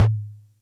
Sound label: Percussion Computer Tom; Atlantex MPC-1; Atlantex Music Percussion Computer